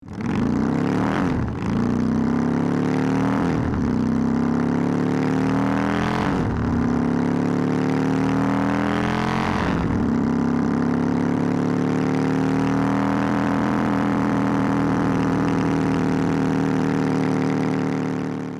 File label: Harley davidson motorcycle driving and accelerating